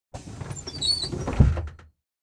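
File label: school bus door close; brakes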